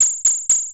BIRD, CREATURE

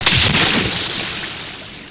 SPLASH

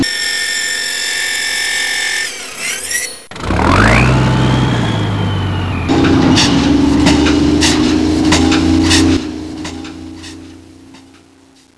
MACHINES

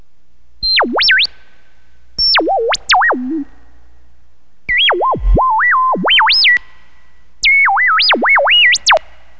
SCIFI

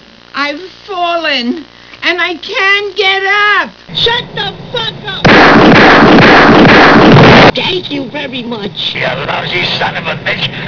OPEN

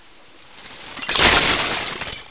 GLASS

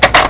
click

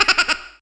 LAUGH BAG, HUMAN

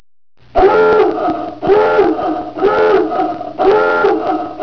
DIVE HORN

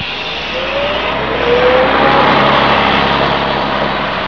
BUS

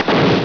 BOOM; WARPATH

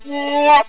ZOOM IN

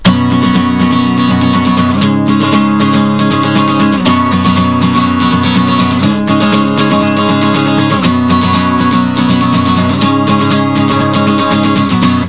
STRUM

